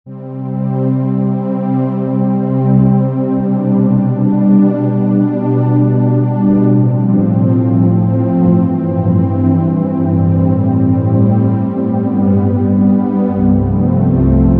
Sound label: Underscore PAD; Worship Flow